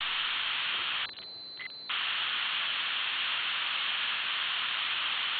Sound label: train up